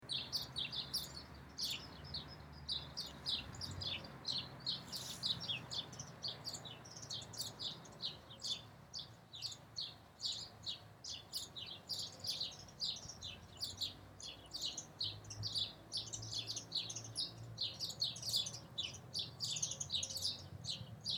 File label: SPARROW CALL One or two birds chirping